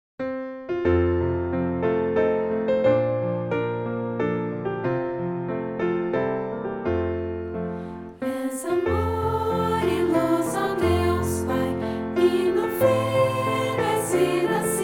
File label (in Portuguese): voz piano